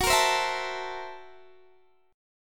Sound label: F sharp 7th Sharp 9th Flat 5th, Guitar, Open D